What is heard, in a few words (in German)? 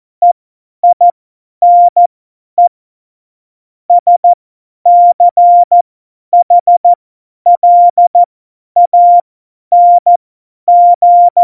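Witz, cw morsen; Morsekurs; Witze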